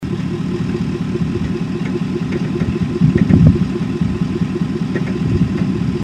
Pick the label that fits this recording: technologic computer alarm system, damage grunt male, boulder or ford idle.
ford idle